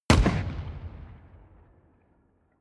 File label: shoot distant